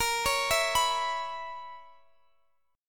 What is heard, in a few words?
B Major 7th Suspended 2nd Suspended 4th A sharp